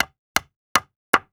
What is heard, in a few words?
Nail Hammer into Wood; Tools